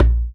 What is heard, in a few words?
JMBFUS, djembe; Univers Sons; Basicussions